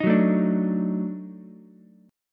Eb Minor Major 7th Flat 5th Eb down Ukulele; EbmM7b5; strummed; JGuitar

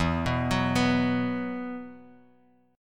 E Suspended 2nd E, down, Bass Standard; Esus2 arpeggiated

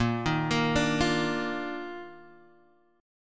D sharp Major 7th Suspended 2nd A sharp

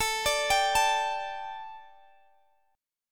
A 7th Suspended 4th Guitar Drop D, chord; x,x,19,19,20,17

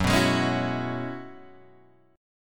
Gb 9th Suspended 4th, Guitar Open G

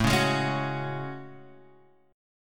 A Major 13th, down Guitar Half Step Down

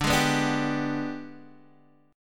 Ab Minor Major 7th Flat 5th chord

